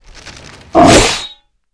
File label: attack